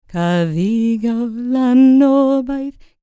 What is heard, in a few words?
CELTIC, Vocal Planet World Voices